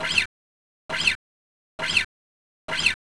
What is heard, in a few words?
rub